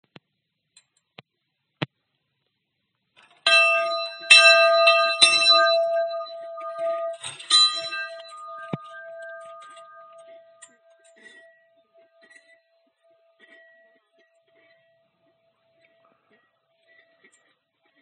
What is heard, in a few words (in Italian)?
campana